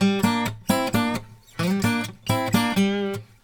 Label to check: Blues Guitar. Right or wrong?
Right